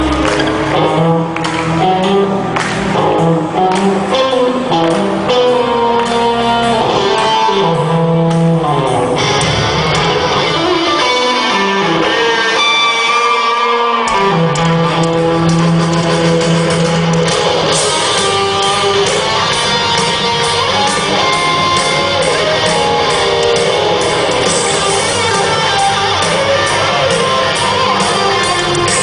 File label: Rock; Pink Panther